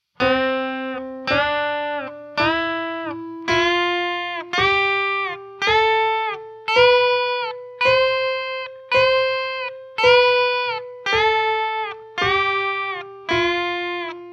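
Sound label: choking guitar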